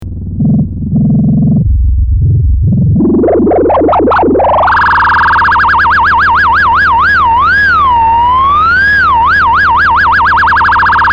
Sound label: LFO VCF bip